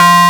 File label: ANALOG BELL; E-MU Formula 4000 Series Vol. 3; Analog Odyssey